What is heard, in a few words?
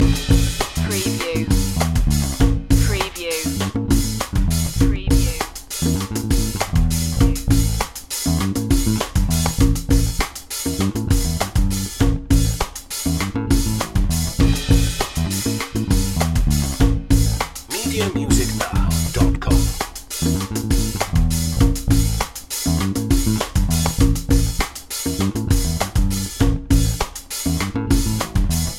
funky loop